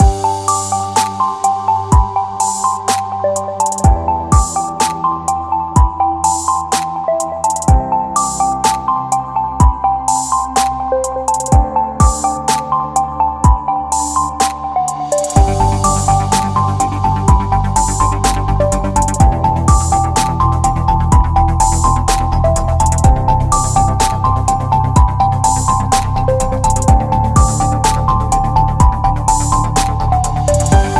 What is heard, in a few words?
Minimal Loop Corporate